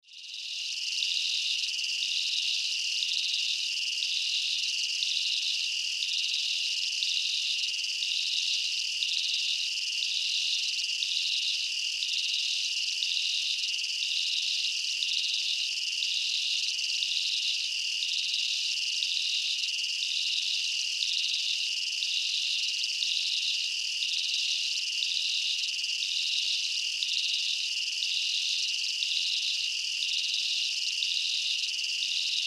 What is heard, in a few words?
Jungle Night, Ambience, Jungle, Night, Chirps, Insects, Bugs; 5.1; Surround Sound; BlastWave